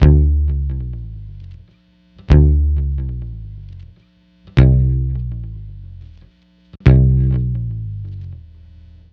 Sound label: Multi Guitar Bossa Bass-D, 105; Zero-G Kocktail Kollection; Construction Kitz (Lounge)